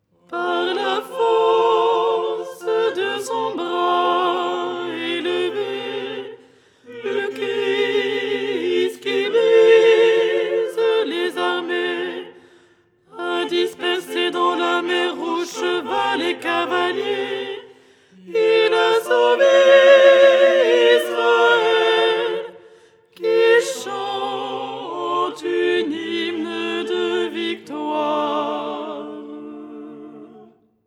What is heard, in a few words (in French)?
alto; 1er hirmos du canon du dimanche; Les 8 tons en français